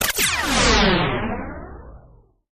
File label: Puncture Space Laser Zap